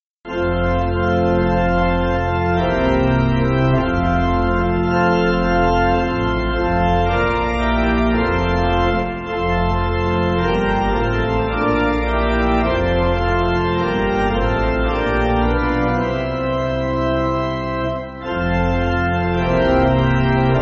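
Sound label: King Creator, pipe organ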